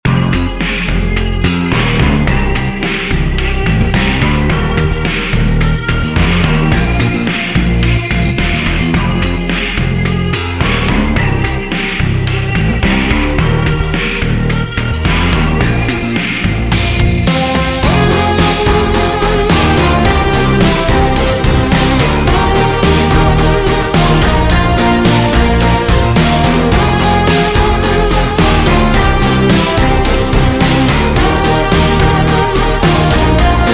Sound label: Instrumental